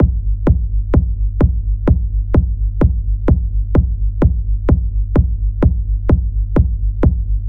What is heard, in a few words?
Techno Kicks